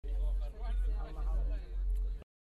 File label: loop